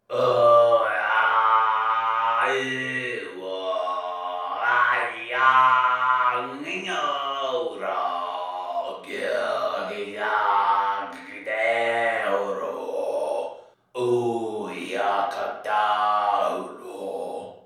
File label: sing